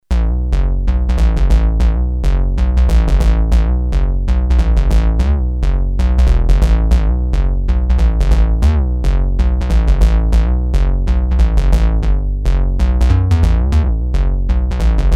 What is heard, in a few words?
bass sh101, yamaha tx81Z